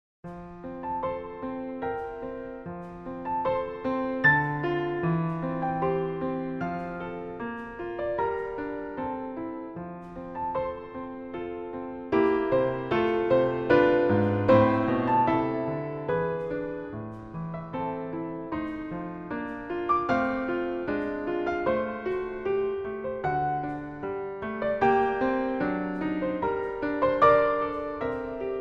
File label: Piano; Max Reger; Mit Rosen Bestreut